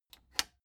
plastic button latch squeak click disable slow

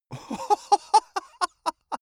male laugh bright

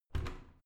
washing machine modern open

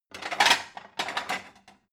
plate multiple take

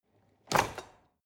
drawer cutlery open lock soft

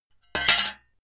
metal plate thin drop

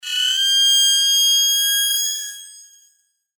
hi freq metallic scream

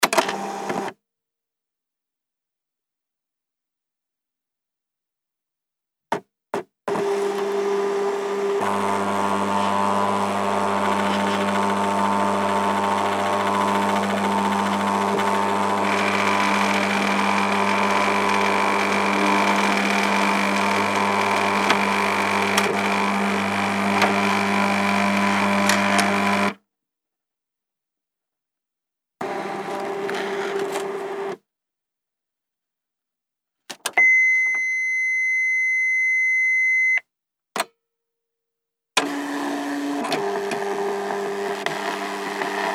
fax sending and receiving

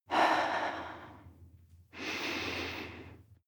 breath female mouth out nose in

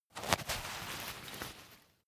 umbrella telescopic open velcro

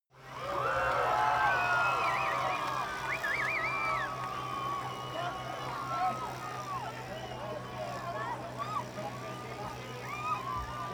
cheer whistle

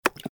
electrical switch

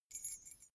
key ring small fly tinkle

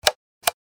light switch

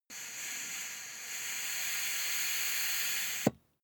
air pump ball

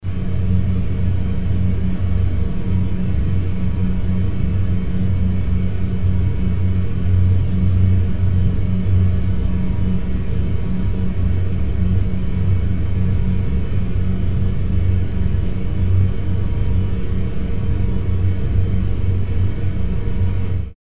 scifi atmo

